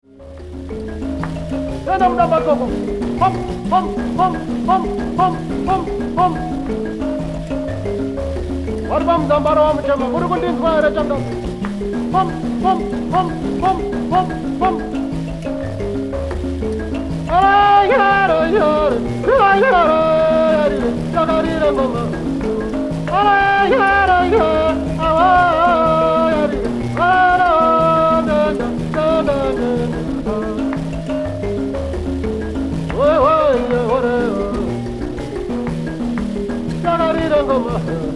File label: mbira